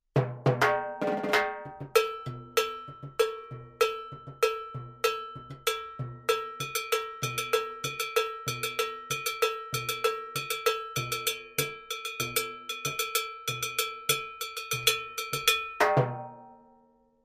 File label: LP cowbell